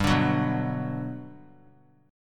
G Major 7th, G, down; strummed; JGuitar

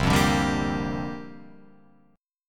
C 9th Suspended 4th, Guitar Open F, chord; C9sus4 strummed; JGuitar

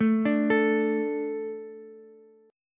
D 5th D, down, Ukulele; D5 arpeggiated; JGuitar

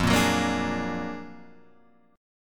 Gb Augmented Major 9th, Guitar

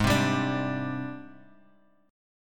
G sharp Major Sharp 11th, Bass Standard; G#M#11 strummed; 16,15,17,19; JGuitar

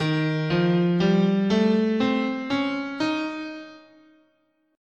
D sharp Prometheus, guitar, scale

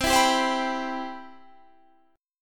C Major 7th, Guitar Standard; CM7 strummed; JGuitar

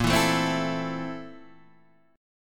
A Minor Bb, Am/Bb chord; Am/Bb strummed; JGuitar

